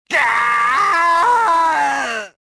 death; reborn

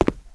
boot, footsteps

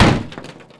CRASH; Totaled; XBOX

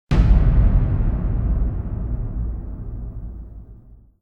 TURN OVER; ArmedAndDangerous; Wrath

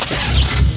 scroll back; Freestyle Street Soccer; XBOX

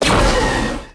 concuss Rifle; weapons

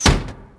Door Swing Small Closed; Max Payne

